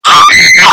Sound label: screaming